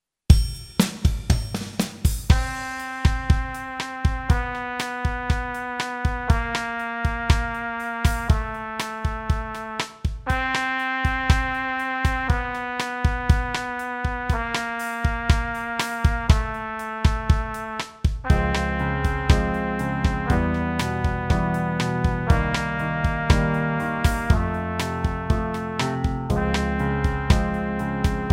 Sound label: Warm up in F, brass